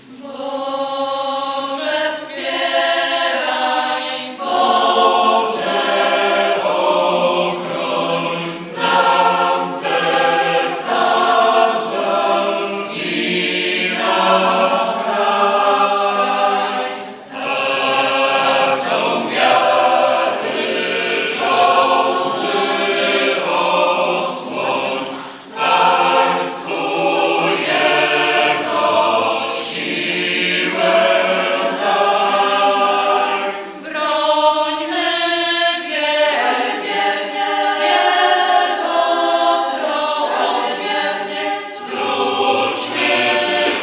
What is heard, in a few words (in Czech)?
hymna polsky